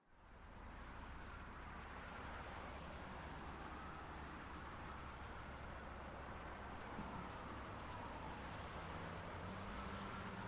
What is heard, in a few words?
Soundscape